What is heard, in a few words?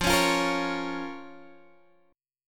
F Suspended 4th Sharp 5th, down, Guitar, Open G